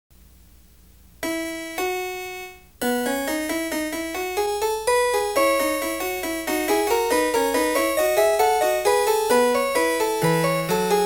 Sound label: bach wtc E fugue